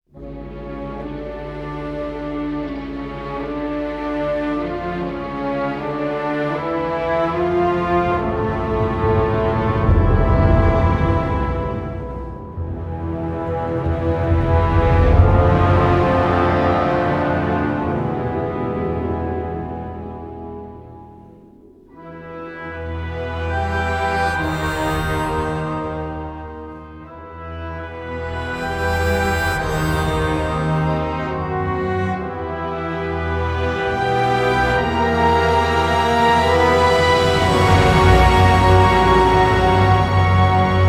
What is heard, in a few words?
Orchestral Version; battlefield bad company; Leggionaires Theme